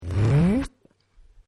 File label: giant snowball; holidays; snowbrawl